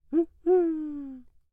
buho

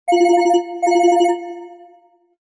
timbre futurista 3d; whatsapp

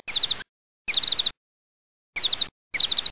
canto pajarillos; whatsapp

